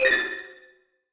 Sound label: large error